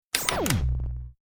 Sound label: nav click; time jumper